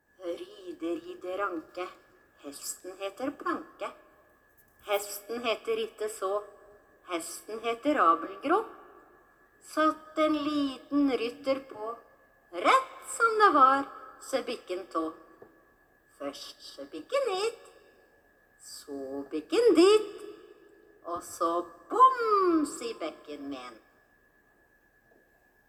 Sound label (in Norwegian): regle ride ride ranke, babysang; trysil